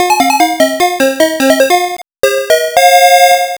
arcade game over; yie ar kung fu

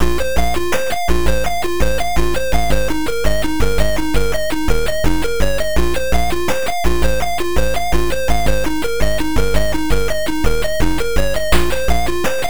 atari; out run; splash wave

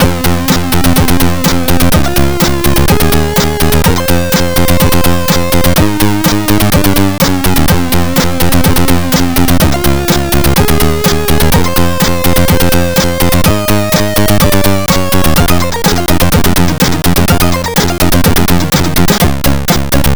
c64 in game; r type

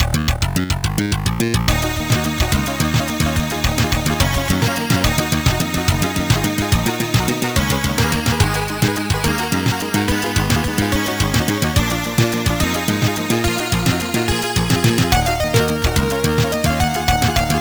amiga in game; turrican iii

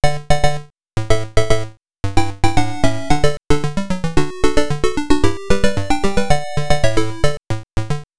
opening, msx; goonies

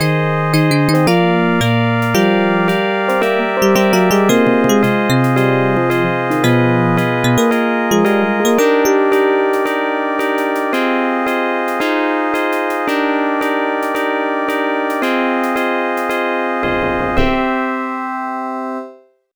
arcade ending; golden axe; windup

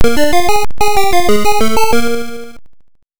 arcade ending; moon patrol

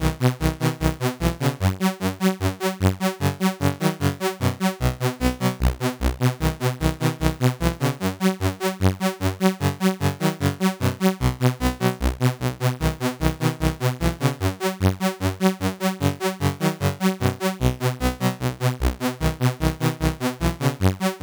c64 in game; lazy jones

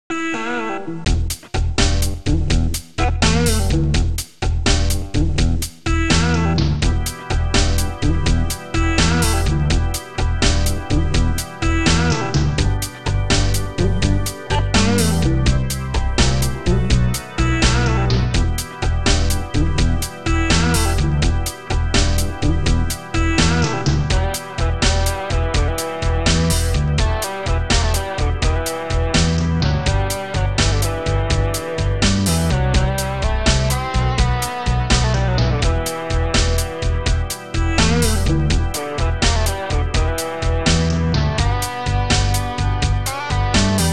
pc chorus and sid midnight blues; go for the record ii

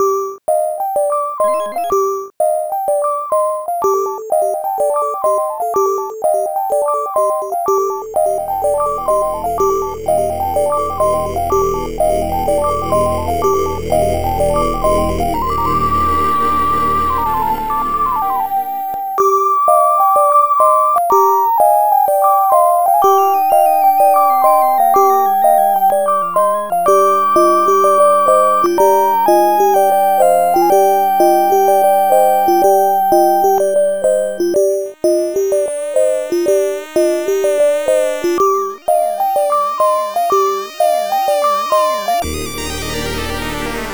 amiga level 4; ghouls and ghosts

